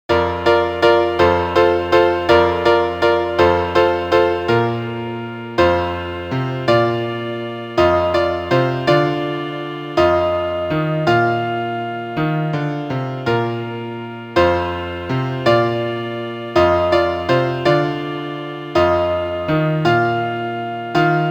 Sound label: piano